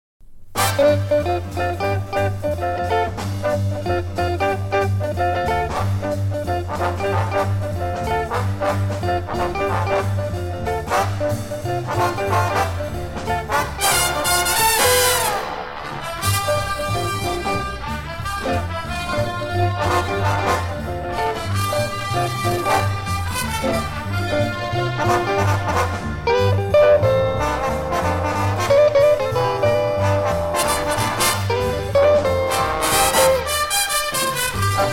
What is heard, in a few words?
Orchestra